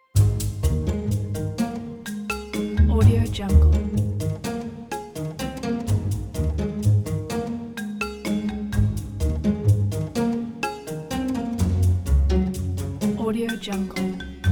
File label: jungle search